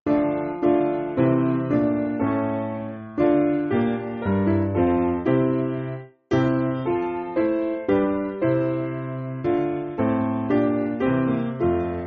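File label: Piano, Free Music Backing Files for Churches; Disposer Supreme; Old 104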